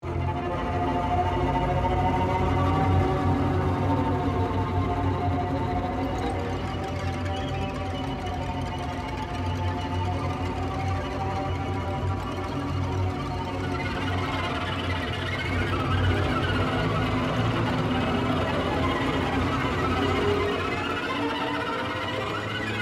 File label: strings; infernus strings; elastic sounds